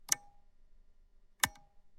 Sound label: mechanical toggle switch